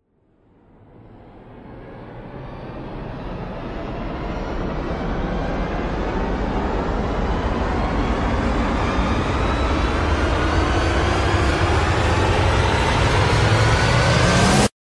suspense

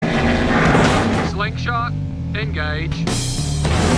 slingshot engage

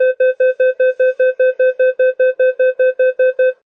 close; mtr

